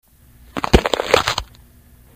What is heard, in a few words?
neck snap